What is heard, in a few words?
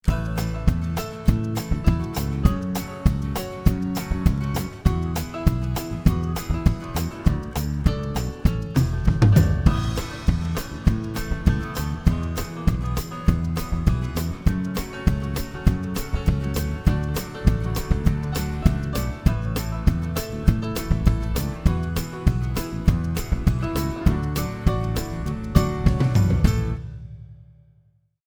Ludwig Country